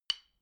drumstick hit soft, percussion

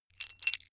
metal pieces clatter short

